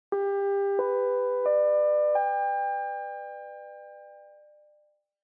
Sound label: Chime Announce